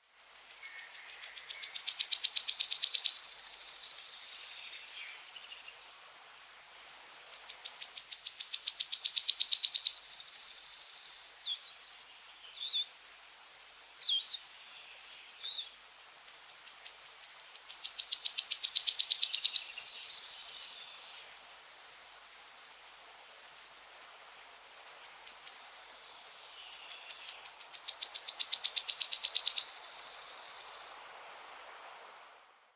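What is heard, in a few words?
wood warbler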